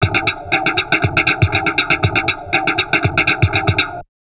DIST, Drum Loops Crazy Processed

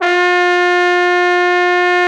TROMBO; Trombone

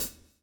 SWING

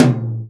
tom, Real Drum Kit, jungle kit

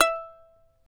charango E4, guitars; Spectrasonics; Hans Zimmer Guitars VOL-2

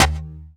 SYN, DANCE SYNTH; Sound & Vision - Gigapack I CD 2 (Roland)

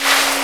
Ambience of the Ocean; SNES; True Lies

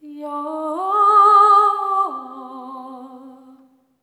LAMENT; Spectrasonics; Symphony of Voices; LAMENTING

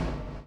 CHAIN; Spectrasonics - Backbeat

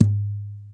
KNOCK, Nylon Guitar

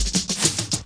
Fills, Roland; Sound & Vision - Gigapack I; Perc

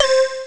Pin Voice, Amiga Soundtracker; ST-XX Amiga Soundtracker Sample Packs

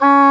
WND English Horn; Roland LCDP04 Orchestral Winds

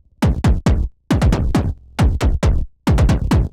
Noise Kick 136, horrorscape samples 136bpm

